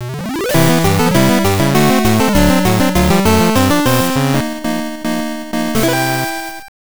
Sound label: Win Cup; Mickey's Speedway USA GBC